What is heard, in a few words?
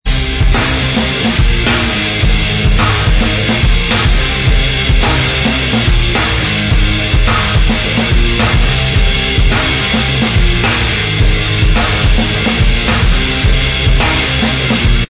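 instrumental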